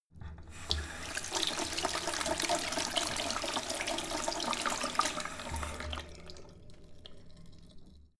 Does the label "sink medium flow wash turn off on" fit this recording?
Yes